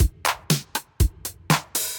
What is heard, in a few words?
Kit 707, Drum Machines; Effected Drum Kits; Ableton; Factory Packs